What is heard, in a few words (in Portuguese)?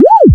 bolha